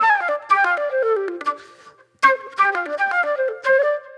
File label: Disco Flute